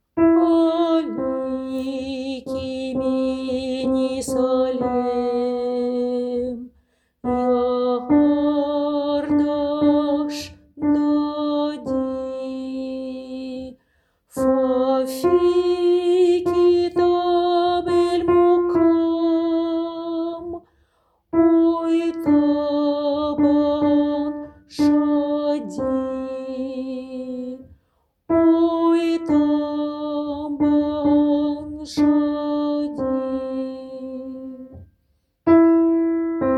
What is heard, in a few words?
Aliki Alti graves; alaiki mini salam